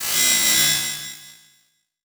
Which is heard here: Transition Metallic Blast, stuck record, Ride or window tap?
Transition Metallic Blast